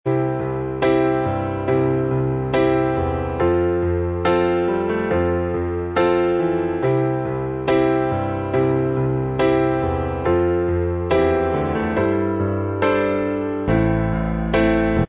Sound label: Inst Track